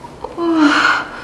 urgh

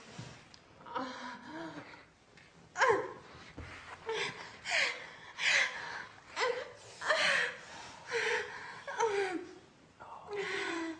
heavy

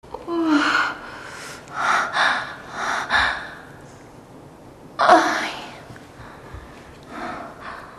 ugh ah ah ai

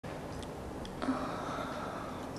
soft ohh

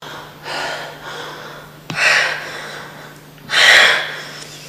breath heavy

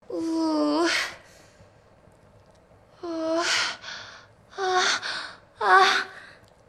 urgh

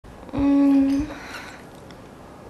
mmm

